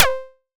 Blip; Bitkits; Blip & Blop

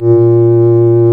REED A#; Big Fish Audio; Synclav FM

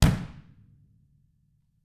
TAMA Drums; TAMAKIT; TAMA HRD

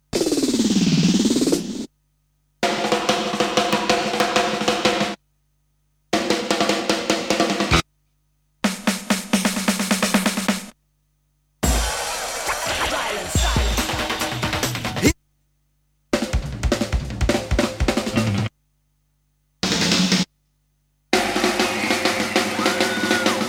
Drum Rolls; Kniteforce; 1000 Samples Volume 1

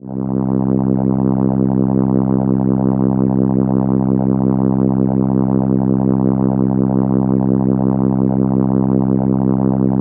Cello C3, ARP Solina

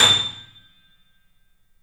A, The Piano; InVision Interactive - The Piano

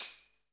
PERC -R, Drum & Bass, 160 BPM; Ueberschall; Drum & Bass Resonance

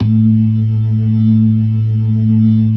LO MAN V R, ORGAN LOWER MANUAL

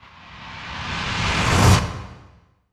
IMPACT RUSH, Cinematic Impact; M-Audio Pro Sessions; Liquid Cinema

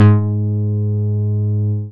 BAS MINI, Mini-Moog

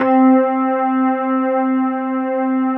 FENDER C3; USB Soundscan vol.09; AKAI; Keyboards Old School

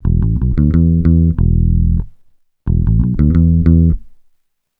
RUSH E, Bass Grooves; USB Soundscan vol.30; AKAI

